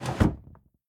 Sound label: Door Slide Close; SFX; The Inn